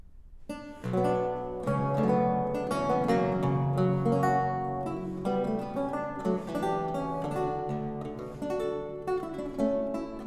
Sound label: Courante, Lute Music